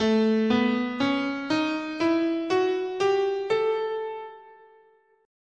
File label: A Lydian Dominant